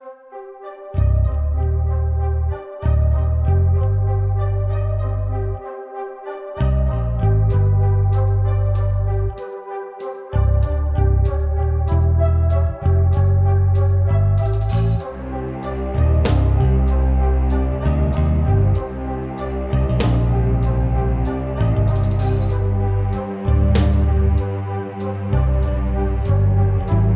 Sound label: Cave; Golden Sun; Dusk of the Lost Age